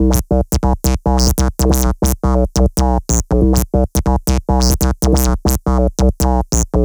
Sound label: Synth Bass